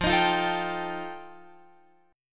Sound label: F 9th F Guitar Open F, chord; strummed